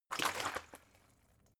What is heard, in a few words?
bottle water big shake single; berufe